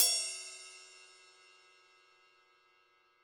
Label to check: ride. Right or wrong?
Right